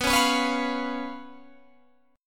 B Suspended 2nd Flat 5th, Guitar, Open G

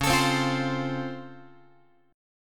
Db Augmented Major 7th, down, Guitar; strummed; JGuitar

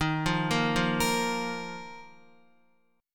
Eb Suspended 2nd Guitar, chord; Ebsus2 arpeggiated; JGuitar

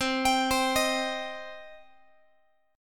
C Minor C down Guitar; arpeggiated; JGuitar

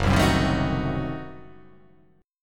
F sharp Diminished 7th F sharp

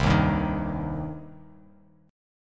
C Minor C down Bass Standard; strummed; JGuitar

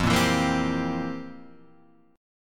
C 7th F, chord; strummed; JGuitar

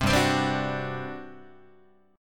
G Major 7th Suspended 4th, Guitar; GM7sus4 strummed; JGuitar

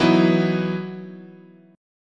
E Major 7th Suspended 2nd, chord

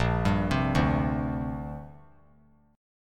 A sharp 6th Add 9th; A#6add9 arpeggiated; JGuitar

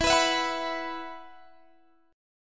E Suspended 2nd E, Guitar, DADGAD; down

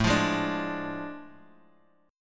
A Major 7th Suspended 4th Sharp 5th; chord

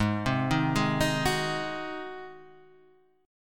G sharp 7th G sharp down Guitar Standard; G#7; arpeggiated; JGuitar

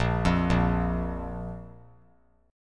A sharp 5th A sharp; arpeggiated; JGuitar

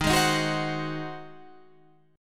G sharp 7th D sharp

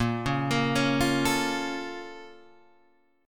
A sharp Minor Major 7th, Guitar Open G

